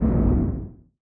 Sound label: distance energy torpedo hit, weapons; Stellaris